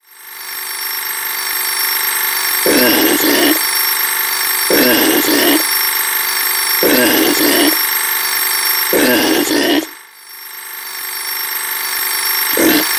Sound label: Clock Alert Crazy Pig